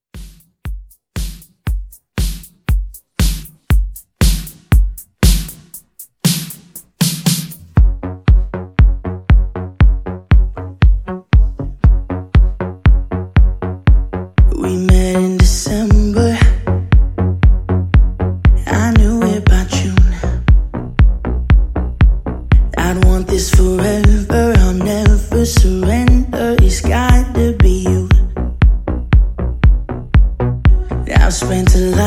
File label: ReDrum Intro; Remixfreakz